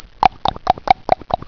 KLAKLA

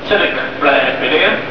UBAHN

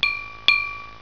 klirr

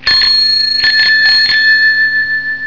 klingel

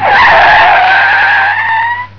Bremsen

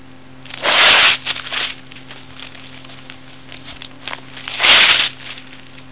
PAPIER

